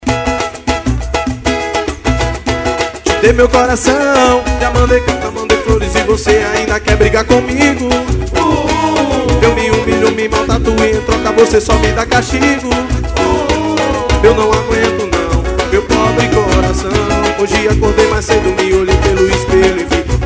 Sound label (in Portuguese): samba ao vivo; ao vivo em apora